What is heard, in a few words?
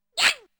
Hit; Namco Game Sound Express Vol.1; Valkyrie no Densetsu